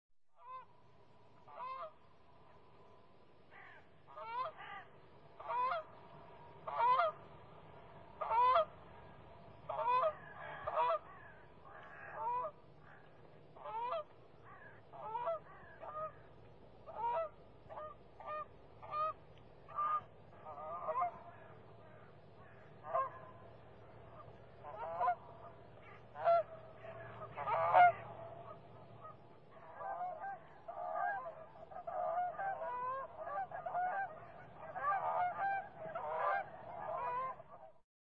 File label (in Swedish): ljud kanadagas